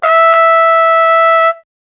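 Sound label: imperial trumpet; trompeta imperial; brass instrument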